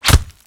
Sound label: hit wall, knife; csgoknife